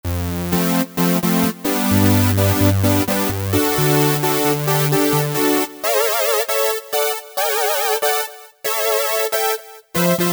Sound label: Stab Saw, Korg MS2000; Factory Patches